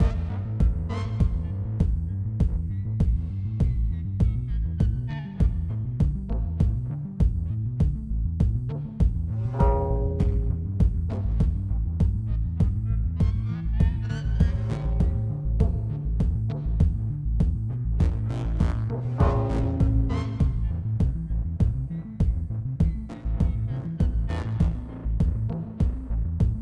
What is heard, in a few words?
Music Gameplay State Tension; Music Hideout1; H1 Level Area=Money Building Nav